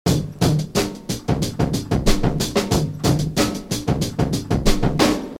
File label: rock dirge